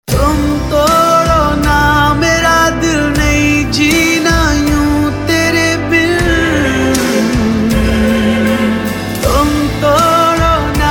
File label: male version ringtone; bollywood ringtones